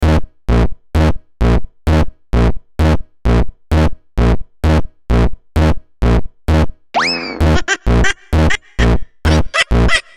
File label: goofy laugh funk